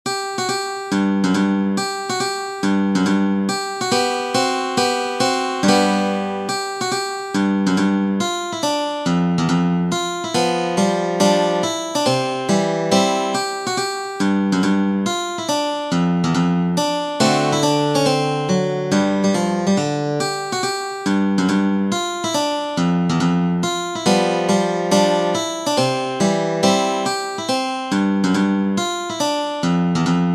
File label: Polka, guitar; Arling Shaeffer; Clipper Polka